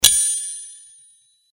Sword Sound; FXHome; Fighting Sounds

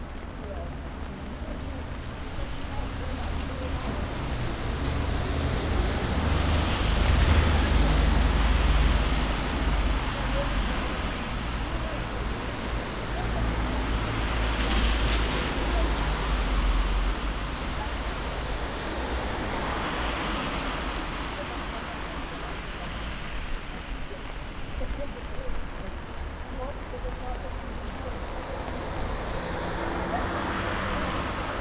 rain traffic; 99Sounds; Rain And Thunder

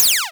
close, Interface Sounds; Kenney's Sound Pack

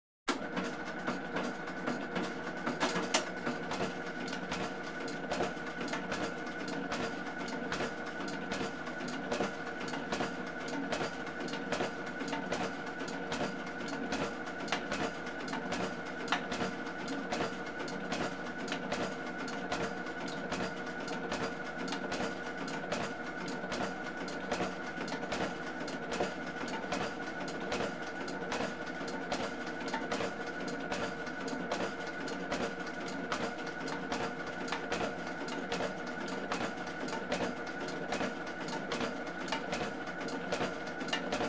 Printing Machinery AND Copiers; Computers Printers Phones; BBC Sound Effects Library

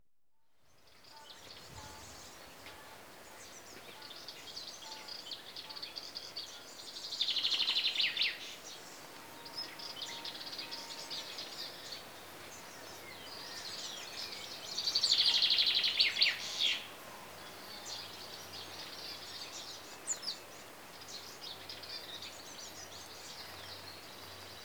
Birds (Chaffinches) And Insects; Greece; BBC Sound Effects Library

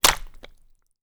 Borax, Impact, Slime, Gore; Mattia Cellotto; The Borax Experiment

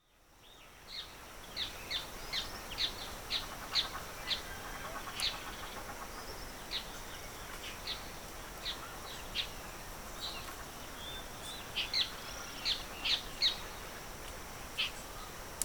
Evening Birdsong, Pantanal Swamp; Rural South America; BBC Sound Effects Library

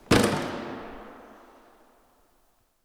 Metal Case Shut; 99Sounds; The Warehouse

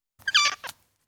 Ten Week Old Kitten, Individual M, Cats; BBC Sound Effects Library

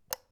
switch, UI Audio; Kenney's Sound Pack

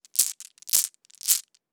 Shaking Marbles; The Sound Pack Tree

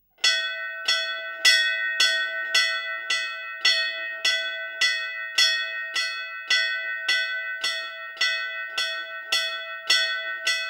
worship Indian religious temple bell; Temple Bells; The AudioVille

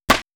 Hit, Hong Kong Action Kit; NoizBoy; Hit Kit 1

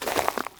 FROZEN FARMLAND Footstep on frozen field; Eneas Mentzel; Frozen Farmlands

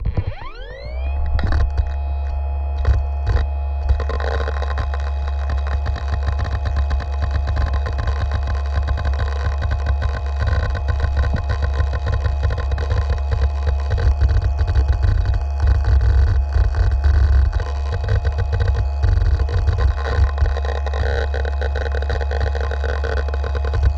external HD, dual channel

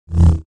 Saber; FXHome

